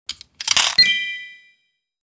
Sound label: arcade play